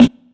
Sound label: short snare sound a sharp key, acoustic snare sound tuned to the A# note